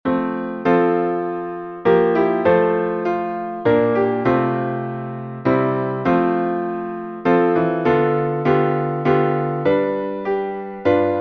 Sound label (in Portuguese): salmo playback